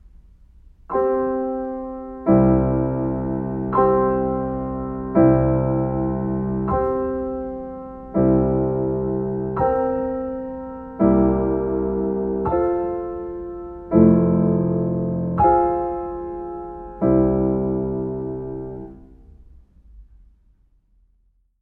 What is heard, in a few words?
sawsee live piano accompaniment